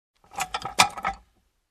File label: Rattling piece